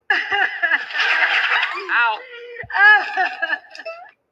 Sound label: crying; ash